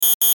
ELECTRICAL DEVICE BEEP; Electrical Button; Multimedia; Electrical Buttons; Blastwave

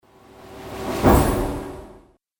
Spell Of The Fallen, magic sound effect

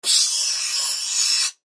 Sci Fi Air Burst Expel Pneumatic Hose Discharge; Sci Fi Air Burst Expel Pneumatic Hose Discharge Processed Science Fiction; Doors

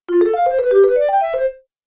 Happy Cartoon Award Sound; Cartoon Music Sound Effects

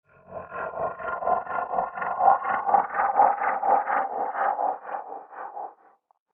Ufo Rise And Fall; Spacecraft Sound Effects; science fiction sound effect

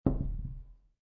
Dice Throw One Die On Felt; Dice Sound Effects; Dice (Sound Effects:Casinos)

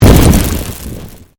Convolution Pulse

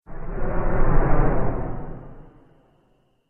Modulation Whoosh; Whoosh Sound Effects

